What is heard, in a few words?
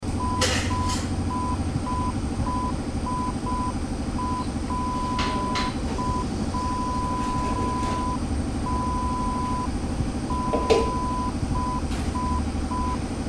Car garage air comp